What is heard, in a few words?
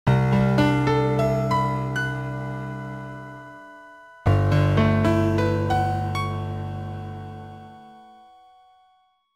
Speaker Test; Dimahoo